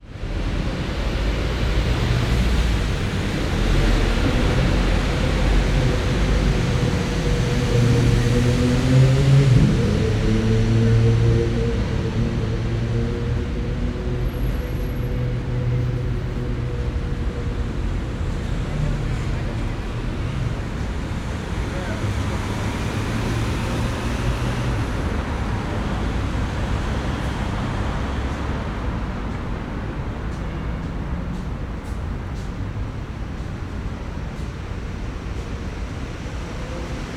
ambience city london uk victoria tower gdns south traffic